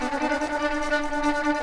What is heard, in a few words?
strings crazy, Sad; Loops